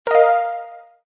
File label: box get ui get; croods